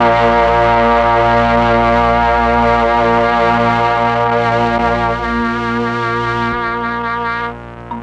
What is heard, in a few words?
MEL STRING; ILIO; Propeller Island